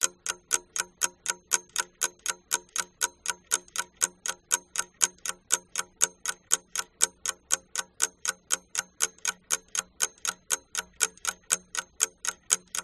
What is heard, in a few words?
alarm clock ticking loop; the sound pack tree